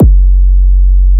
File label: Warm 808 F